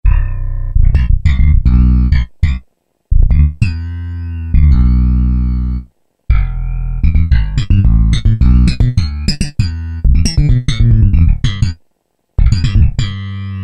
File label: BA, Yamaha TG77; Factory Presets; 63 BA Erix